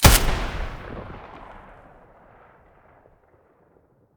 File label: ash12 muzzle mono, weapons; STALKER; IWP